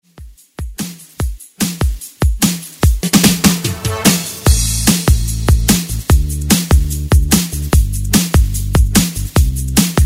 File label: Dance Mix